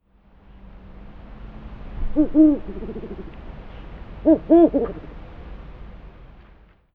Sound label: Lesser Horned Owl Bubo magellanicus